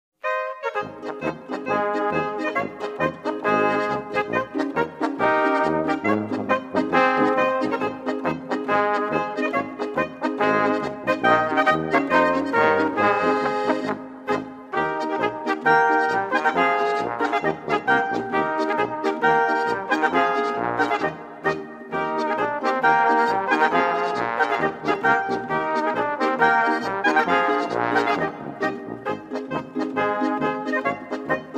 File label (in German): Polka; MV Kleinsoelk